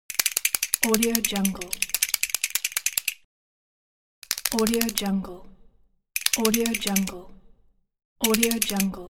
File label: skeleton knocks teeth